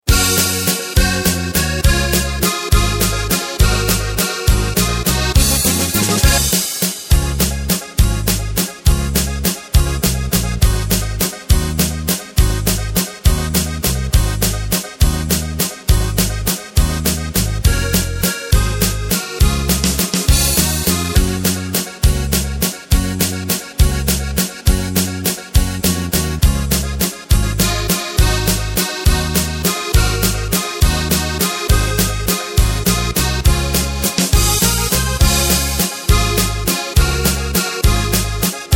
Mariazeller Lied mit Drums, Playback

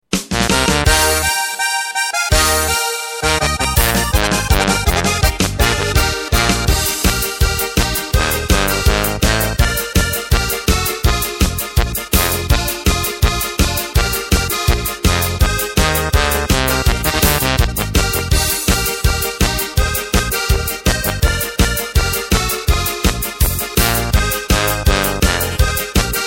Playback Lyrics; midifile

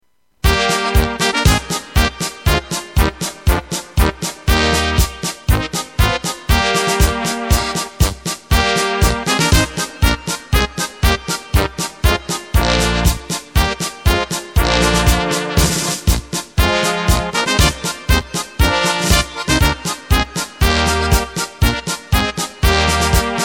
Polka

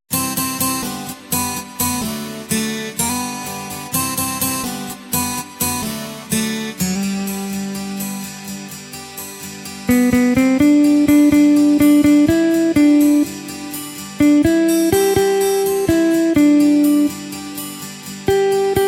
Nimm d Gitarre

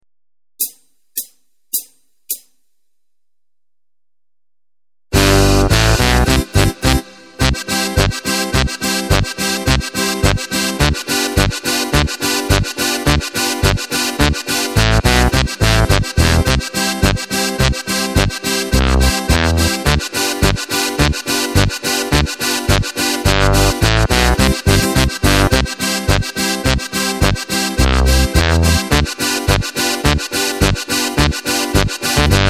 steirische harmonika; midifile

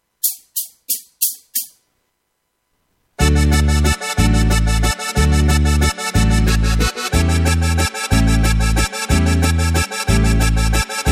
Walzer

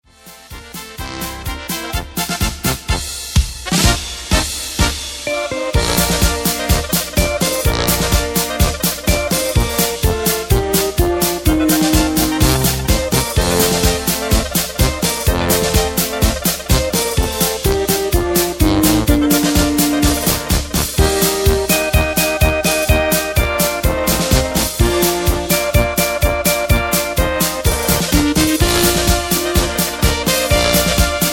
Polka

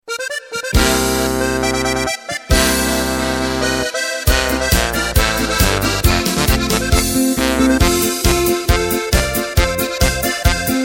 DRUMS

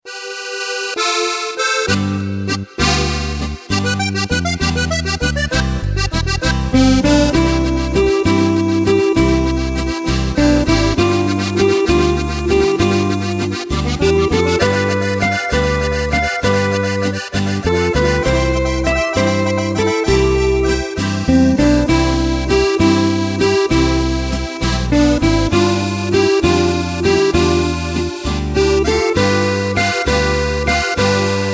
Schneewalzer

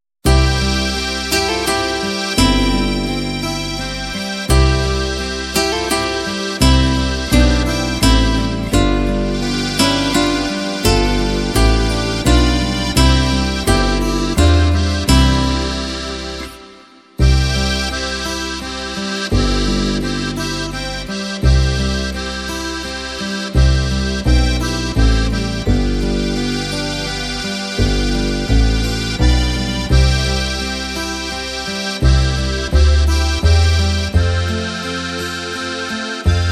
Stille Nacht, Playback

